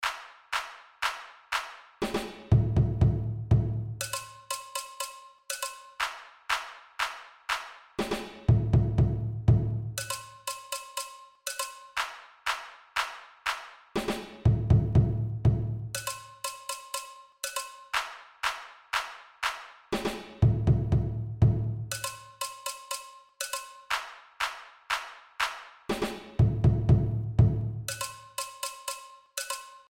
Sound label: break